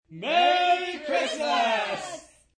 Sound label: Group Merry Xmas, Cheering, group; Party Sound Effects